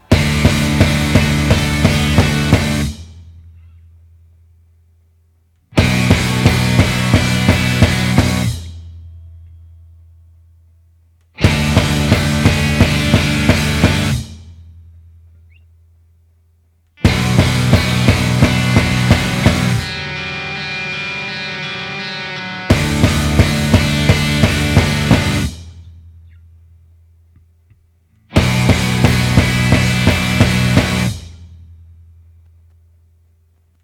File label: bells; metatron